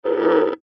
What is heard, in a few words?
goat bleat